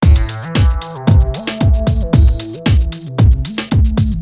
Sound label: techno